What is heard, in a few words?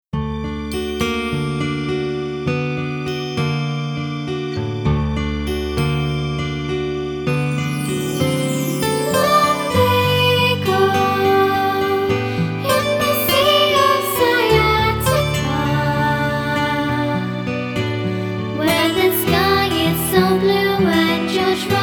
Song Lumbago Lullaby